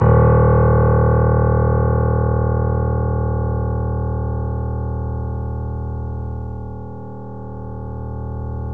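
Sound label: D#5, Bösendorfer, 3D Pianos; E-MU Producer Series Vol. 5; 3-D Audio Collection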